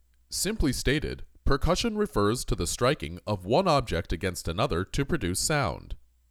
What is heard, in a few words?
Percussion